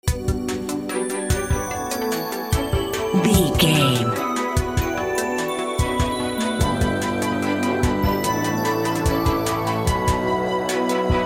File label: video game title screen